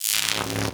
Arc Rifle Fire Random; Infested

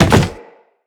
Land Soft Wood, Footsteps; Characters; Operator

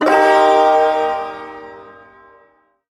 Shawzin Blues Chord

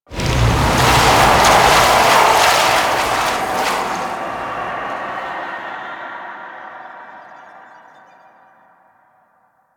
Hex Melt; Warframe; NineteenNinetyNine; Stage11FuturePast

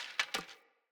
Hunter Bow Ranged Equip End; Weapons; Player; Bows; EnvoyHunterBowRangedMelee

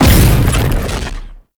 Shred Explosion; Warframe; Temple

